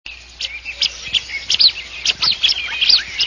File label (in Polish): cyranka modroskrzydła - młode; wroblowe; anseri